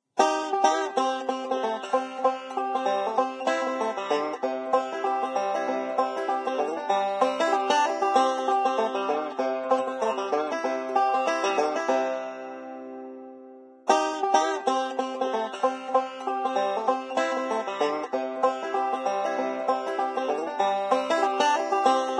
Banjo; Zomboid; Lifestyle

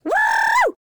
Woman Woohoo; Zomboid; Lifestyle

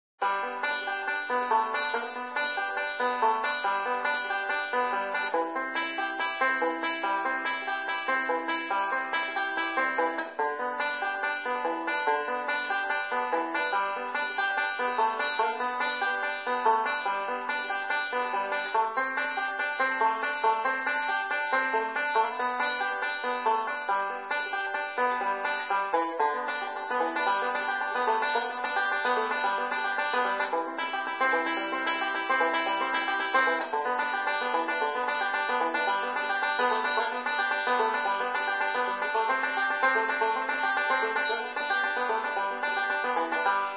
Banjo; Zomboid; Lifestyle

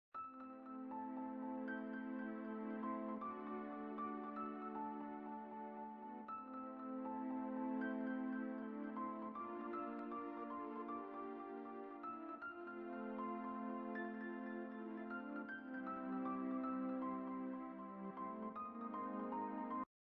SNES; Zomboid; Video Game Consoles; Mana game

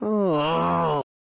Man Frustrated; Zomboid; Lifestyle

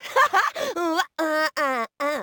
Woman Dance Happy; Zomboid; Lifestyle

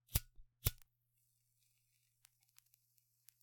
Green Fire Light Up; Zomboid; jiggas Green Fire

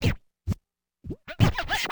scratch, DJ Booth; effects

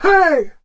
shout male; Zomboid; Shout Sound

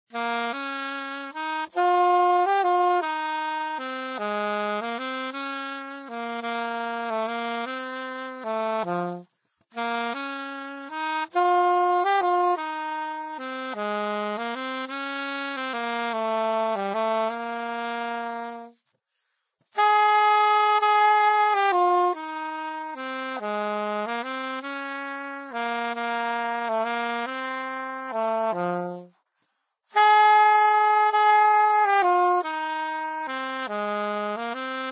Saxophone; Zomboid; Lifestyle

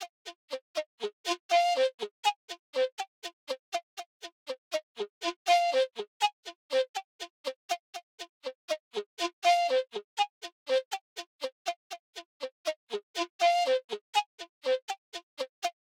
flute; Zomboid; EntertainYourself

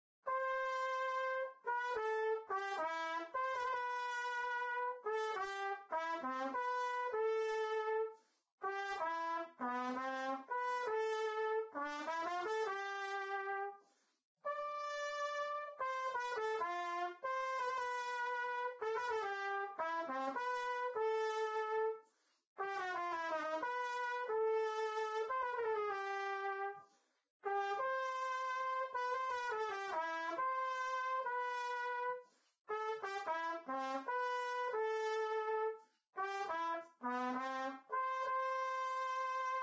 Trumpet; Zomboid; Lifestyle

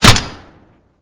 suppressed shot; Zomboid; VFE

